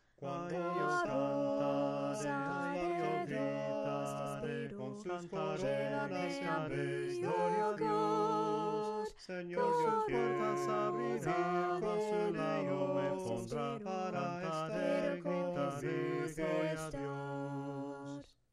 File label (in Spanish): himnos a capella; POPURRI CELESTIAL; cantos del camino